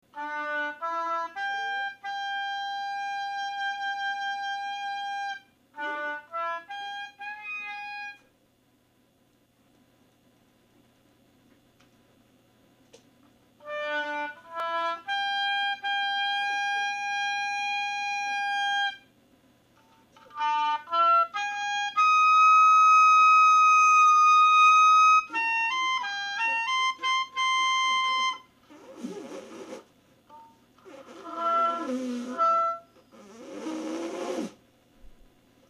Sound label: oboe